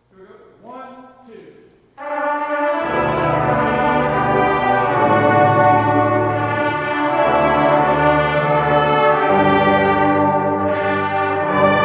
Happy Birthday, brass; woburn ma

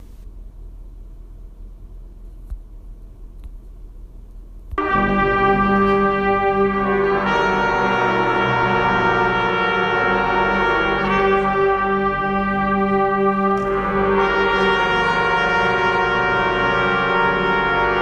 Chord Progression

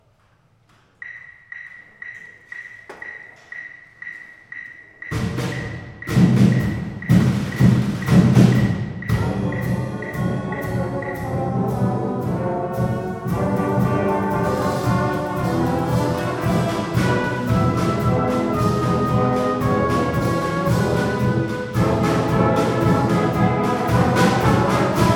Jingle Bells, brass; North Star Brass

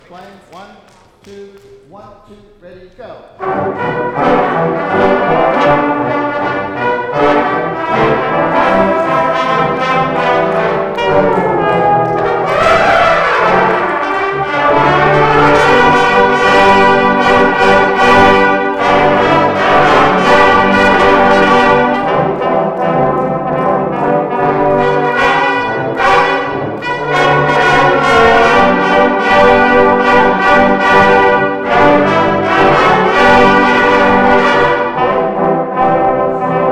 Jingles Bells Run, brass practice

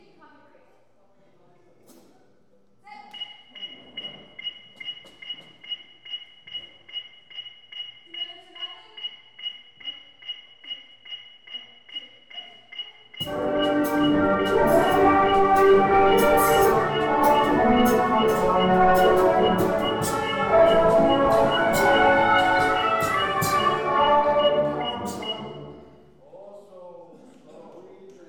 O Solo Mio, brass; north star brass; woburn ma

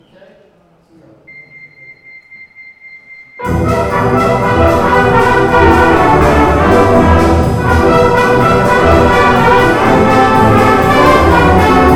Cantina, brass; North Star Brass

